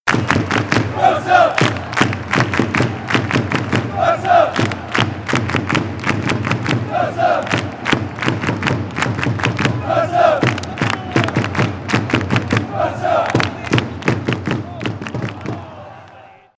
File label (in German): Barca Fangesang; barca fangesaenge